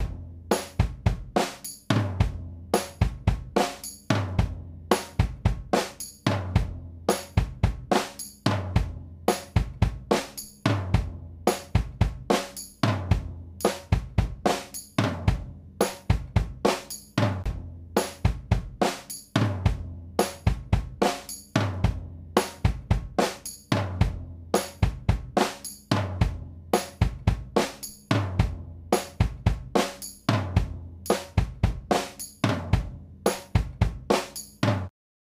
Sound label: Drum; MDBDrumsPlusPlus